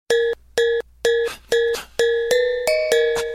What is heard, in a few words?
Tone For Sms